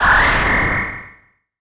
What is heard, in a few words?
laser